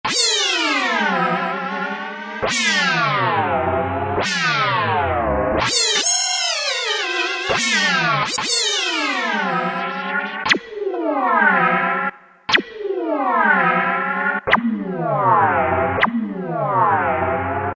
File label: Meow scratch, Kurzweil K2000; SYNTHS; Kurzweil K2000 Value Pack; Extra Programs